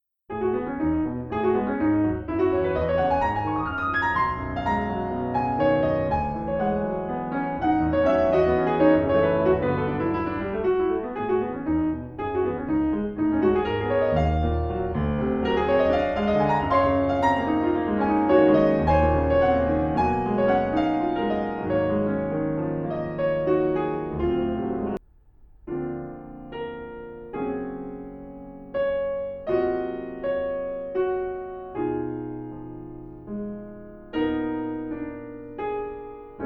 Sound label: Piano Sonata Excerpts; Silberstein